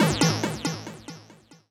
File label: TECHNO EXTRA 140